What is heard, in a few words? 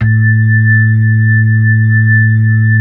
B-3 Hollow; Xfade; Keyboards of The 60's and 70's